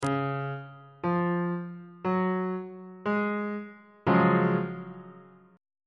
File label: f sharp major 7th suspended 2nd 2nd inversion on bass clef